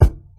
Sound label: fat kick drum sample A key; kick drum samples